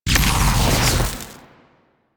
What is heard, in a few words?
Flame Thrower Shot; Drill Sgt